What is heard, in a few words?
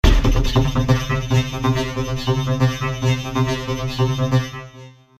loop; v-synth